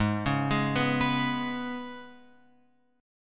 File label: G sharp Minor Double Flat 5th G sharp; {4 4 6 4 X 7} arpeggiated